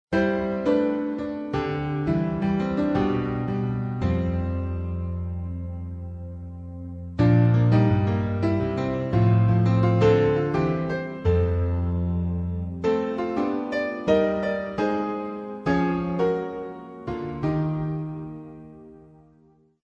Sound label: newage